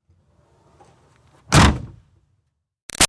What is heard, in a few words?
Car Highlander Trunk Close; 2005 Toyota Highlander V6; Cars; Vehicles